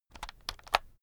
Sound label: keyboard external shortcut long